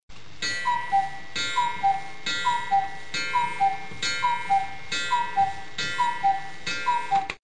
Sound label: cucu